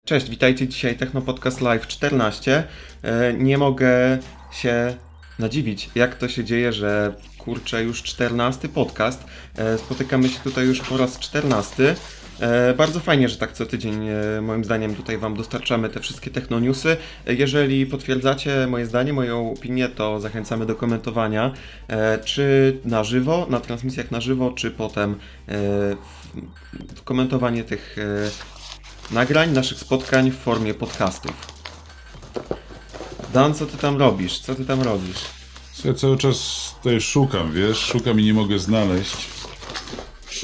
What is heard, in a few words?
Techno Podcast